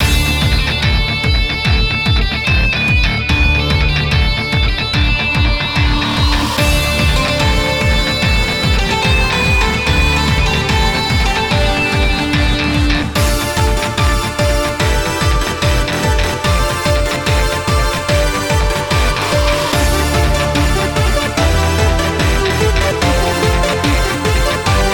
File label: Stage; gunlord dreamcast; Air Stride (Stage 2)